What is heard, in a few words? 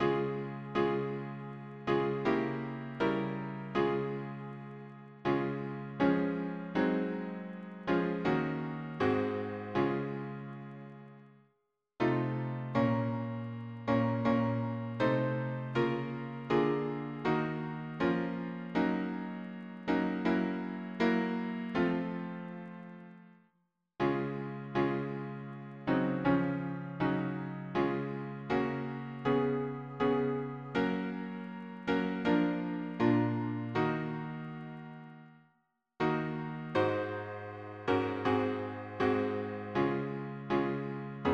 harmony piano